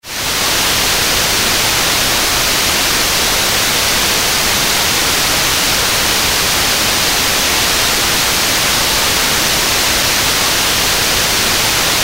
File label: Soft White Noise; for tranquility